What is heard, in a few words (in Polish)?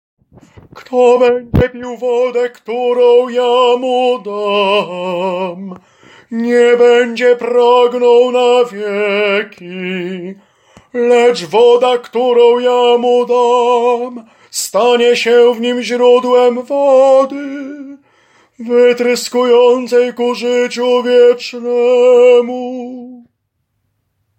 TENOR; Wody Jordanu